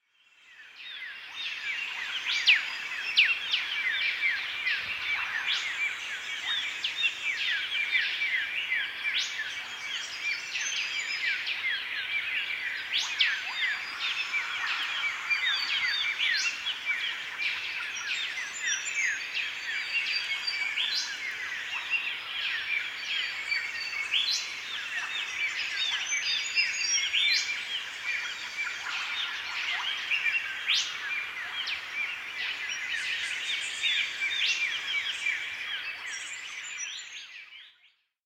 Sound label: Waratah Flat dawn soundscape; The Sounds of Recovery